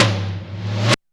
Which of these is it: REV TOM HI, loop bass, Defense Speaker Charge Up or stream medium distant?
REV TOM HI